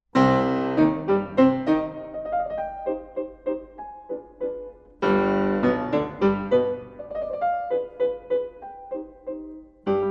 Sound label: piano sonata c min